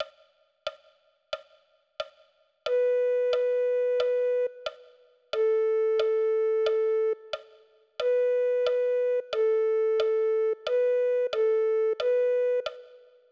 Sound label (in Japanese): リコーダー